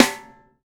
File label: LD Snare; Snares